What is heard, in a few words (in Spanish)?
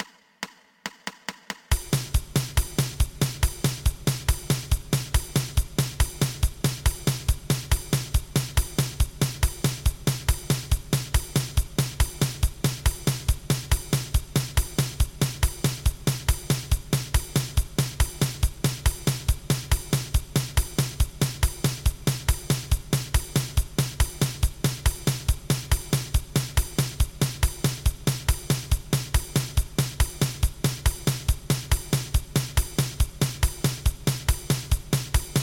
2 pulsos